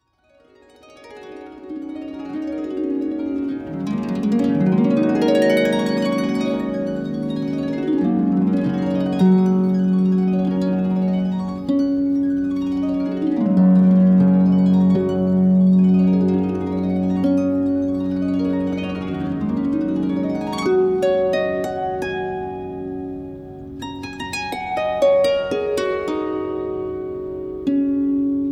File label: harp